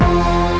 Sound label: SYN TV; Best Service Gigapack 1